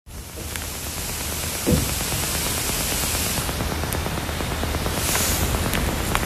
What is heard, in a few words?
noise; iLoud MTM new batch